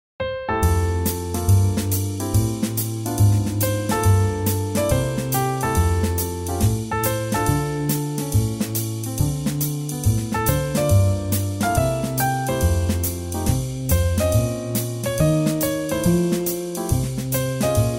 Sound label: Swing Jazz